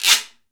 GUIRO; Cuba; AKAI S6000 CD-ROM - Volume 5

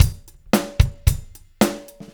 112, Beat Loops; Crush; Zero-G - Monster Beats

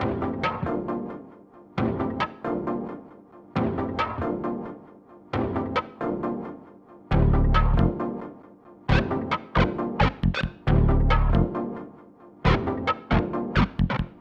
Hardcore Breakbeat, 135 Dmin; Big Fish Audio; Hardcore Breakbeat Action; Gimme Soul

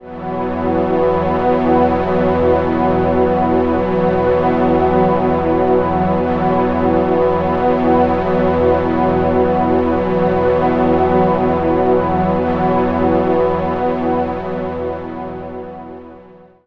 Sunrise E, Ambient Pads; Zero-G Seismic Frequencies; 90 s Sample CDs

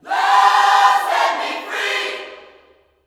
LUV FREE, Vocal Gospel; Vocal Planet Vol1 Gospel

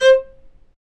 Low Marcato; Roland L-CD702; Combos 1

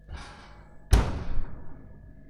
Oven; M8; percussion and foley

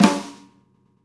Rock Snare Fl H, Roland BK-5; Snares

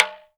TALK DRM, Heart of Africa; Spectrasonics

